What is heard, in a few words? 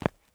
FOOTSTEP Trainers Asphalt Run mono; Phasmophobia